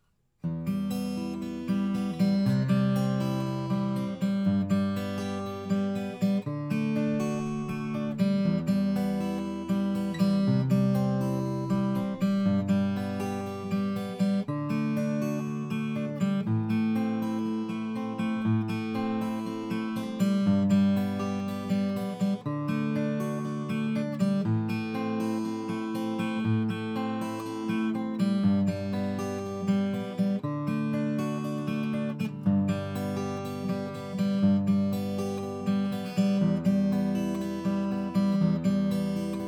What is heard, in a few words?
Acoustic Fingerstyle